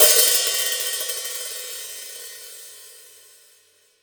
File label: Real Drum Kit, JUNGLE KIT; Best Service ProSamples vol.43; Real Drum Kits